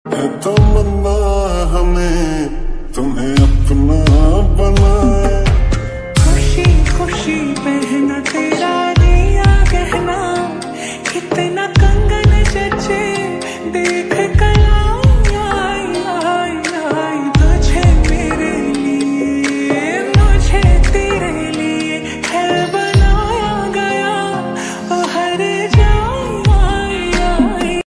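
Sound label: mashup slowed reverb ringtone song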